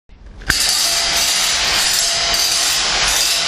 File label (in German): Bauzaun meets Bolzen